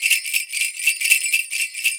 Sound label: DRM; Best Service ProSamples vol.52 - World Instruments 2; AKAI; MIX DRM LOOP